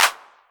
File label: 808 Clap; Drums; Novation Drumstation